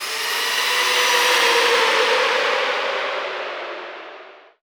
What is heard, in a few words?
sfx sci fi; Masterbits - Switch FX